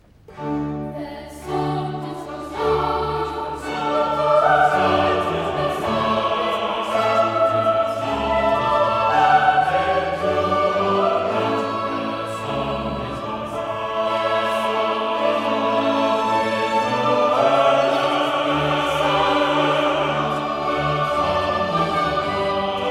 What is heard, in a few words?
Chorus; Handel Masterworks